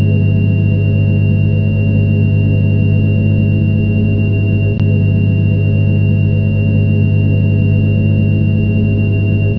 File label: power drone